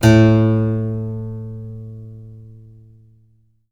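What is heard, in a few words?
HARPSICHORD STOP A2; Best Service Advanced Media Trax Modern Composer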